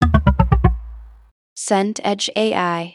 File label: error wrong